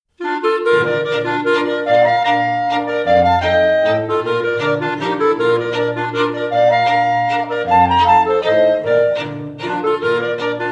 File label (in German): Landler